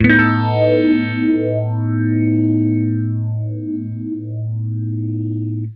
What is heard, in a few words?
Clean Phase A7, guitar, clean phaser, chords; Guitar Special